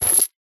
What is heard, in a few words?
equip chain, armor; item; Minecraft; Conquest Default